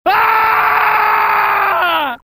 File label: Aaaahhhh